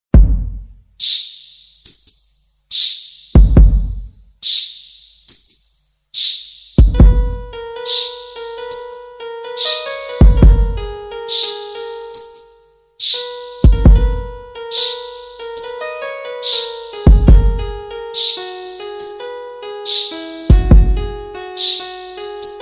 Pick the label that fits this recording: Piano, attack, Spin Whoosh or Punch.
Piano